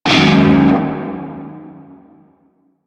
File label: Shawzin Yo Chord E; Music; Warframe; Narmer Shawzin